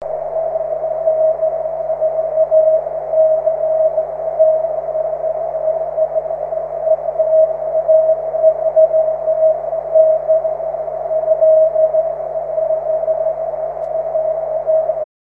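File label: continuous wave, 23cm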